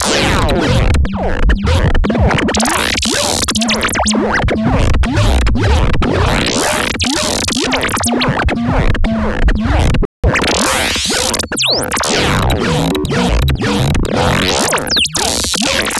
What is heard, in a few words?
PROTOTECHNO